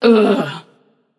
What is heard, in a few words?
ugh passion; chaac